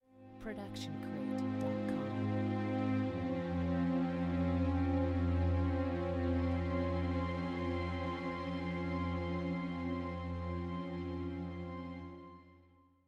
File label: sad string